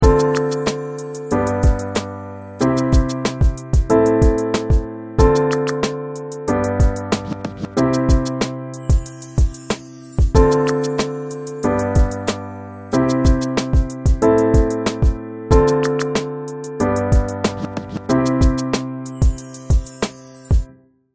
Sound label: 3step RnB mix